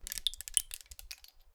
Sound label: Rain Stick, Percussion Samples